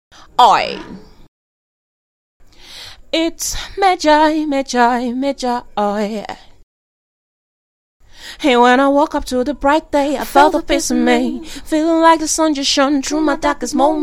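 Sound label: hip hop sing, 86, B major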